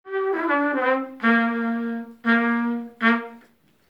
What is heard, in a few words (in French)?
Trompette